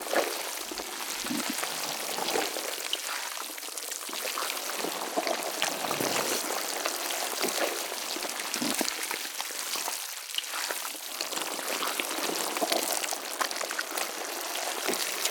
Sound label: Gore Loop